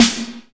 perfect snare drum sample a sharp key, acoustic snare tuned to the A# note